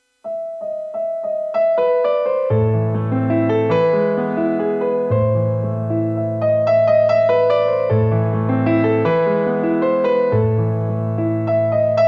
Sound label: Piano; Christmas Piano Masters; Fur Elise